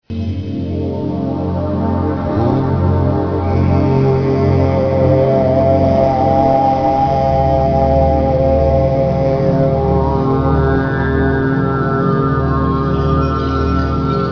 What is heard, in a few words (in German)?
Walgesang